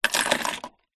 car keys put down in internal plastic car tray

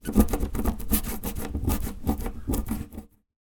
wood scratch scrape remove mark; foley

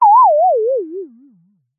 cartoon dazed hit sine wave wobble

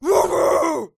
attack growl double bark low pitched; lukas tvrdon

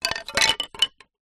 foley wood pieces thin small light drop; zapsplat

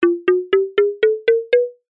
cartoon ascend mallets low to high slow

